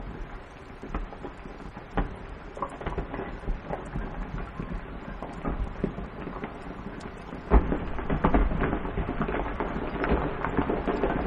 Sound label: Rock Slide With Large Pieces at End; alaska acoustic highlights; GEOPHONY